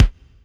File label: kick; stan kit 2; yamaha reface cs